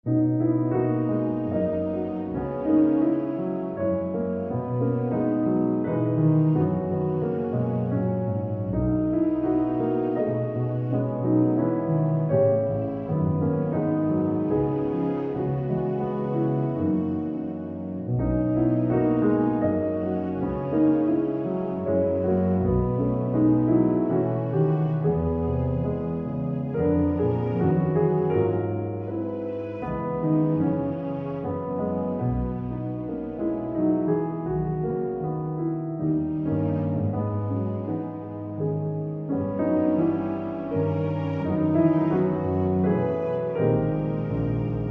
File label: With Strings